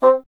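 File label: wind bassoon C; Miroslav Vitous - Solo Instruments VOL-1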